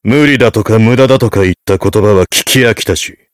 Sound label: heavy meleeing